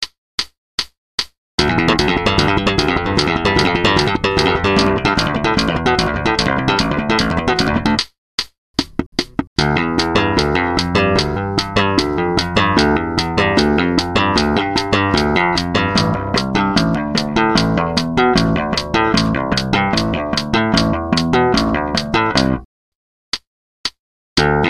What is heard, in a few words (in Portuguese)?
exercicio slap